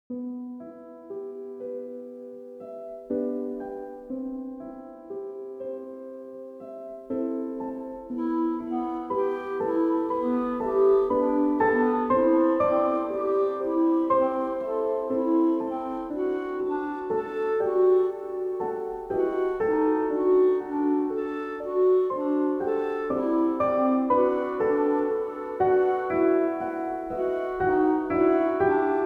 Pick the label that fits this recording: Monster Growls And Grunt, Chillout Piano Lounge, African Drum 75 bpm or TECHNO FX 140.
Chillout Piano Lounge